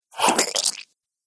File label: Heavy Axe Thrown At Enemy; Battle Sound Effects